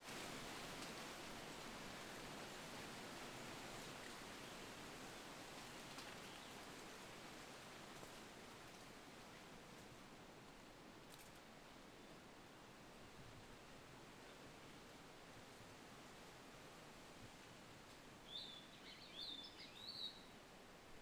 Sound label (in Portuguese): Vento com folhas intenso e suave passaro